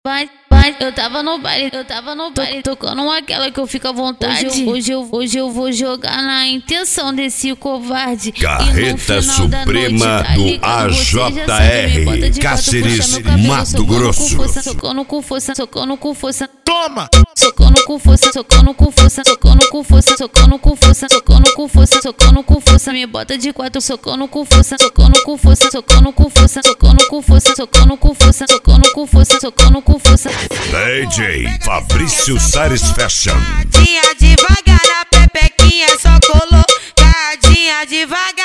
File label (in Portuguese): carreta suprema funk